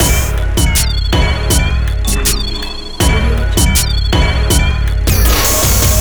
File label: 80 bpm extra loops; soundmorph